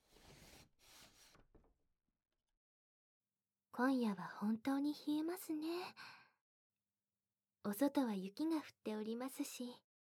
お布団の中で耳舐め手コキ, ASMR

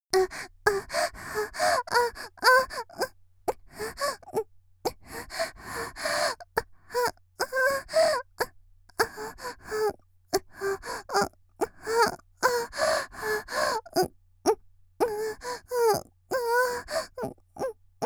「喘ぎ」ノーマル喘ぎ30秒; 綾波系少女

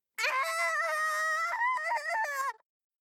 手を刺す

